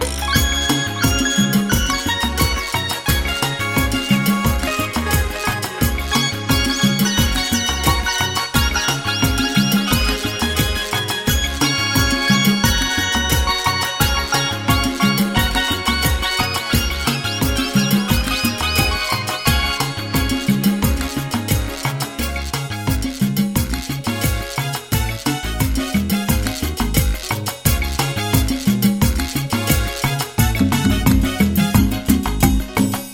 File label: Timba